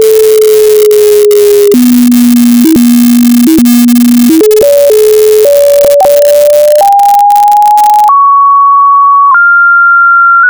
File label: sinus